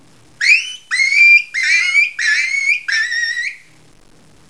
Chiot coyote en detresse; Blue diaph

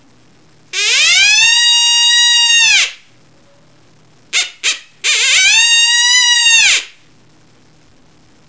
Appel Invitation, femelle, coyote

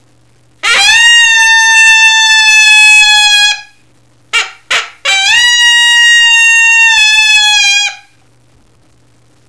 Appel d'interrogation Male, chevreuil

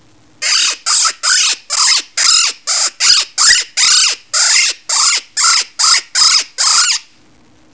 Oiseau en detresse; Psych Tweety RA

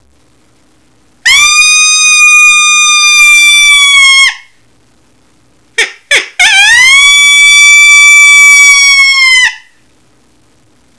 Appel d'interrogation, chevreuil; Bill Austin

